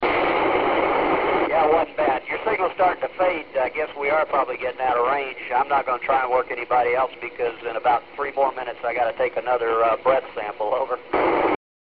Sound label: breath